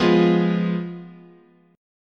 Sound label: F Diminished, chord